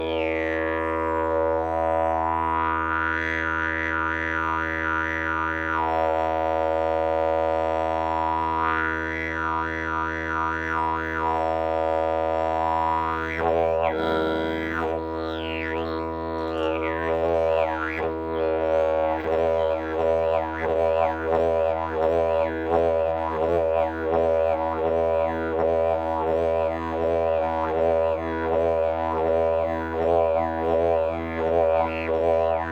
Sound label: didgeridoo; briza palice; 32 mm; Ladění : Dis; Tomáš Dufek